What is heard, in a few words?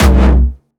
C2, Jumpstyle Kick; Jumpstyle Multis; vengeance essential club sounds-3; VEC3 Bassdrums